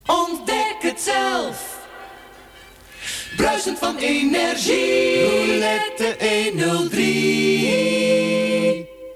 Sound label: silence